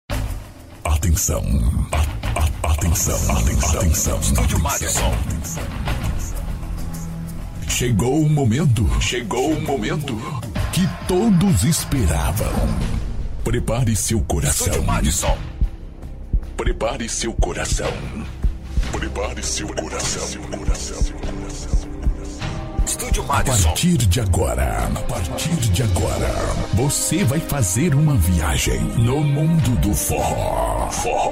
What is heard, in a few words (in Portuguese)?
ao vivo abertura